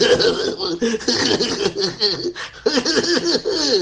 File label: evil laugh